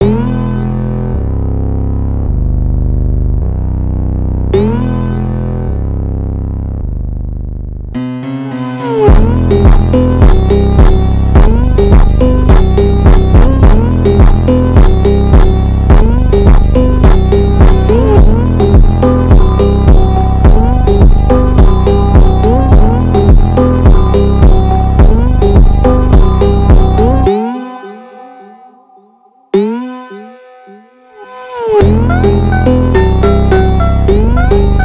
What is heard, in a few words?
Instrumental Slowed